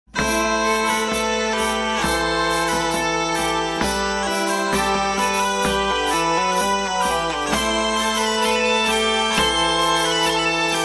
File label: Bourre, Traditional